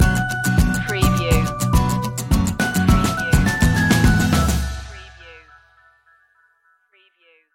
adventure plus stinger

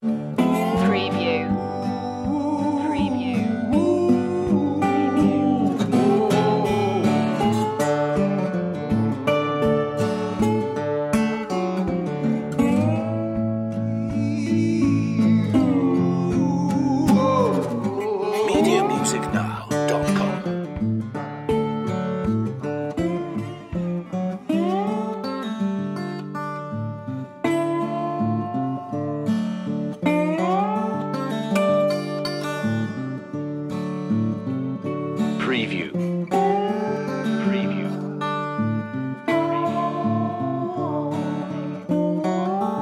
soul broke blues

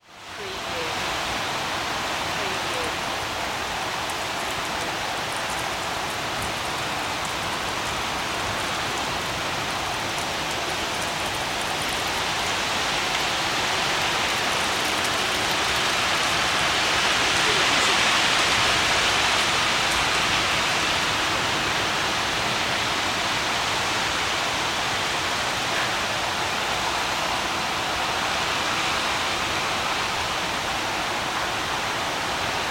Rain Pour City

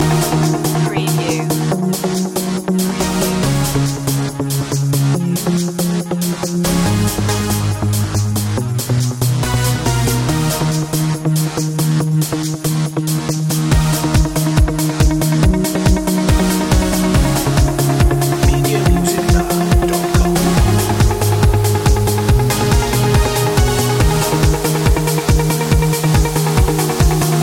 mystic loop

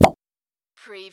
CARTOON PLUNGE

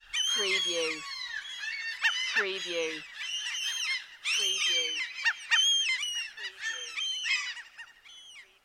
Many Seagulls Calling and Cackling

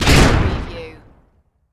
EXPLOSION CLOSE PERSPECTIVE SHARP BLAST

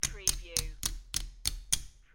Poker Chips Counting Chips

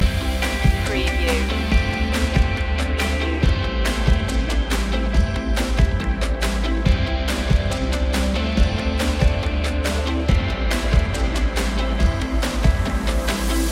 creative days loop

